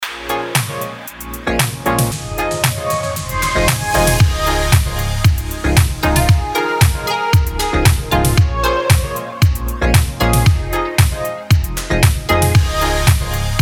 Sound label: BED DANCE F MIN 115BPM